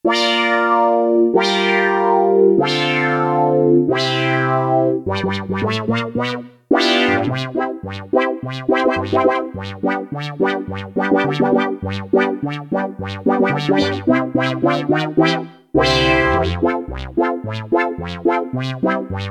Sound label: SWEEP, Roland JX-1; Factory presets